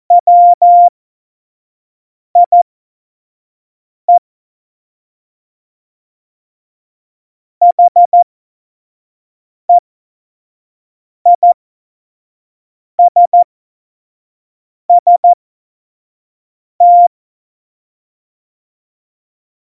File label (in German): Witz, Morsekurs, Tempo 25 F70; Witze